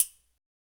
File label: Perc Toys; Spectrasonics; Supreme Beats; World Dance